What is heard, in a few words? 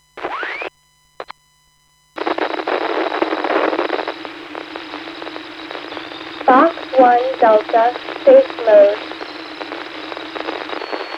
145MHz880; fox 1 delta